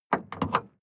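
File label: sports car exterior bonnet handling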